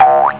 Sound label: Big Jump; GoJoe PoGo